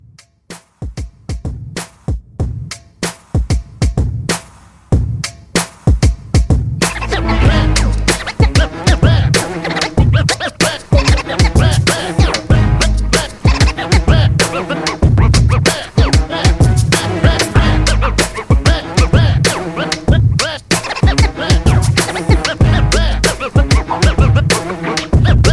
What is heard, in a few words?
Scratch Edition, 95